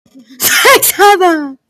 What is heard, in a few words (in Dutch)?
lachen